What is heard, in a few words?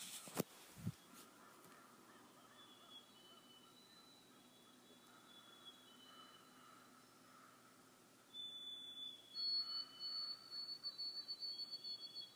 Birdsong